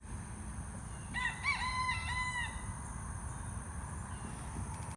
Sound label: resident rooster; field recordings; scout island